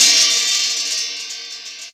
hh open, Yamaha TG-500

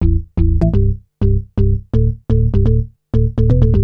bass 125; BASS LOOPS; House; Best Service ProSamples vol.10 - House; AKAI

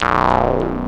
DANCE SYNT, techno trance

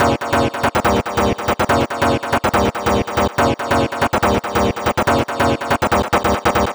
Stab 142 D#, Club Techno Stab Loops

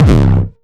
909 Dist Reso - C1, Kicks; Mbase 11 Kicks

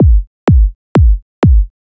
Kick, Trance, Drums; Sonic Foundry (Sony Creative Software); Trance NRG VOL-1; Kicks

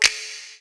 SNAP; Best Service; Dance Mega Drums; SNAPS

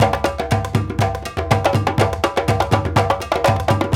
120 GUIN, Guinea; Spectrasonics; Supreme Beats; African Contemporary

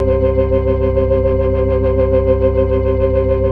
FAST VIB, ORGAN, TREMOLO VIB

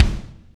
KICK hard, Drum Kits; Big Fish Audio - DOM; Redrum

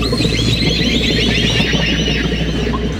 A#3 BIRD; Northstar - The Wizard VOL-1; WIZARD SYMPHONY

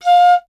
FLT PIC F, Piccolo; Miroslav Vitous - Solo Instruments VOL-2

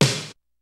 kicks snare, kid; Kid Nepro; Elite; KICKS SNARES

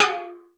CAN, PERC COMBI; Crypton; DrumBase5000

